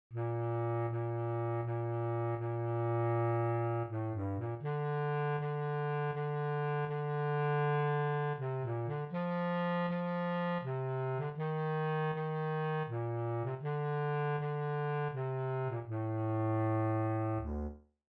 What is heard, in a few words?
bass clarinet